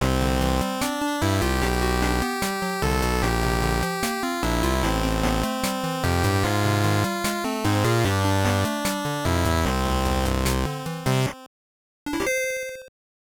Jingle; Mia Hamm Soccer Shootout 2000 GBC

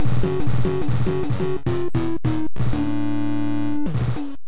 Race Lost; Race America; NES

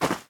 Ground short

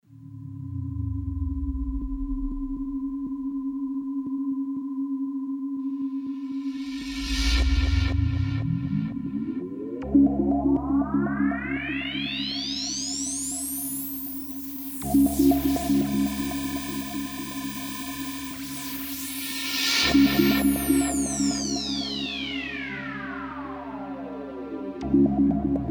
Caves Ambience; Alaska

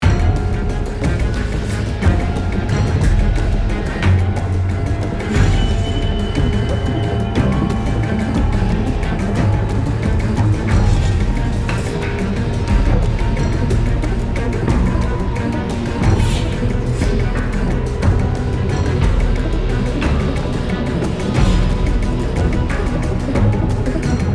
Action loop

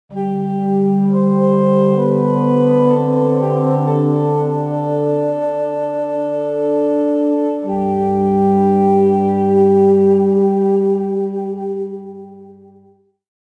Ancient Calm; Civilization Revolution

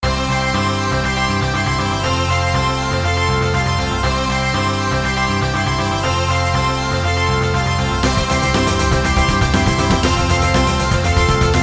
Rock; katawa shoujo beta version gamerip

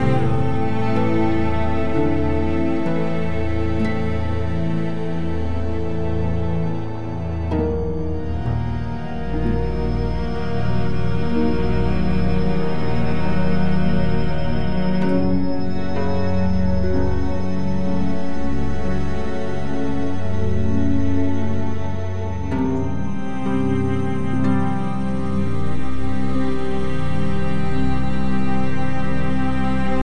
loop Suspense; the walking dead michonne 3 what we deserve

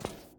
SFX Footstep metal; this is the zodiac speaking 2020 gamerip switch ps4 xbox one pc macos

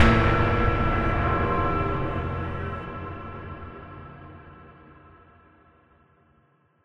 Short Sting; Solus Project

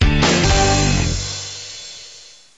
Startup; Pokerom

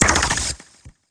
down gatling; Gonner

